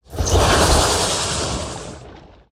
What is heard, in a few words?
Coilfang Constrictor Play